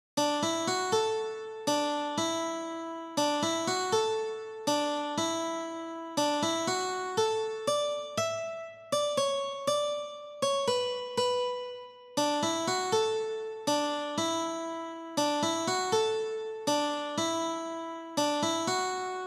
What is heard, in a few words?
Waltz, dulcimer